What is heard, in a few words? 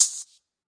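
shaker; Western and Latin Percussion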